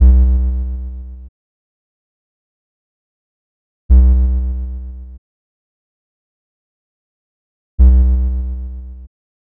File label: Plucked String